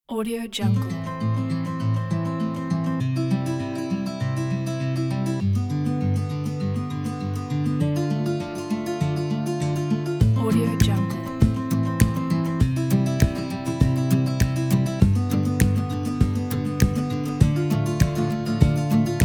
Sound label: warm sentimental acoustic folk